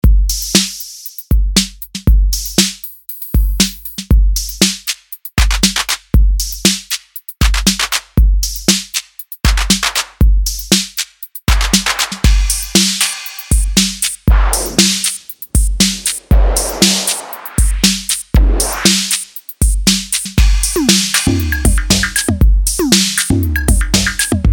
Drumsynth Kit Breaks Modal Drums; MASCHINE 2.0; Medley 2

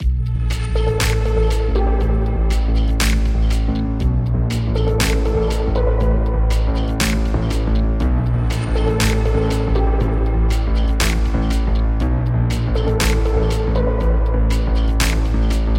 Electronic

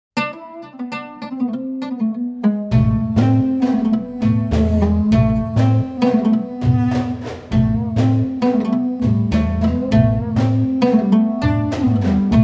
World Strings Oud; Camel Ride; Kontakt Player

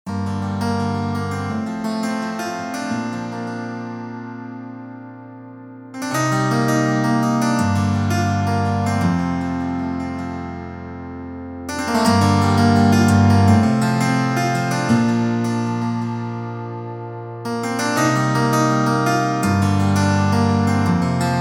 natural; KontaktPlayer

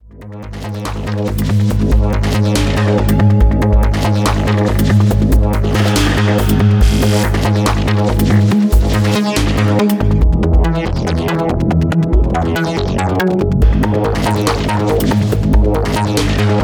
Glitchy Break Beat; PathFinder WT

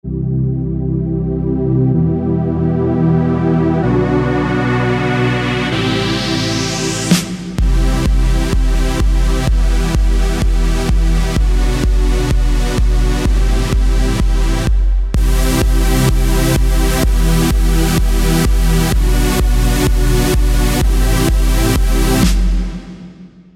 side-chain; MASCHINE STUDIO

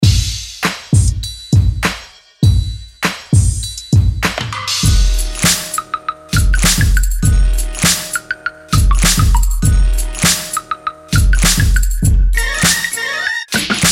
Urban; MASCHINE 2.0; Mixkit

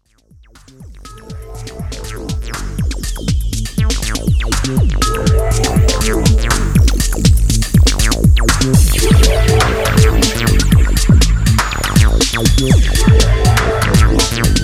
Oldskool sidechain synthdrum; MASCHINE 2.0; Time Traveller

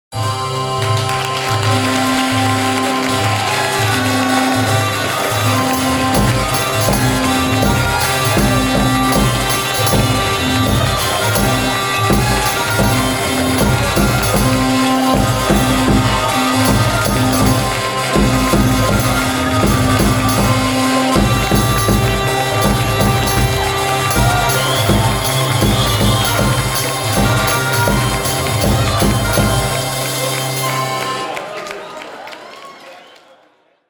Hurdy Gurdy; Sonokinetic

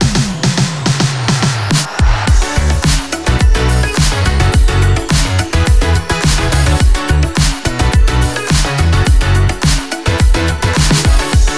RETRO, 106 F m

